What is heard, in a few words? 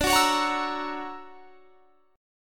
D Augmented Major 9th down; D+M9 strummed; JGuitar